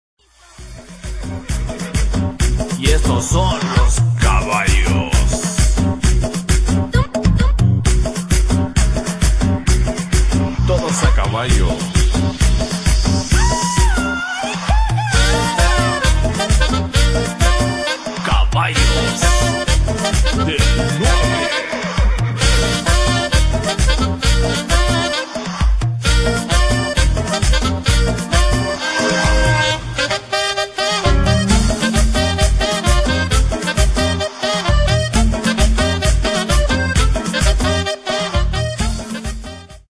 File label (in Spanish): tribal